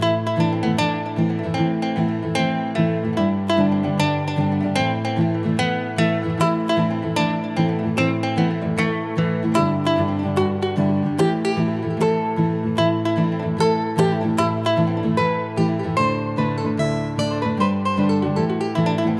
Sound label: MUSIC DANCE